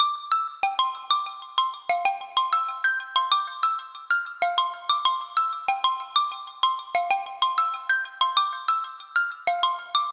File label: Raindrops, ringtones; Xiaomi MIUI-9